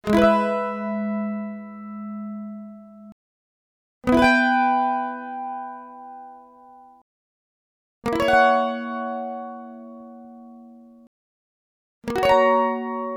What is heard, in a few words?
mandolin chord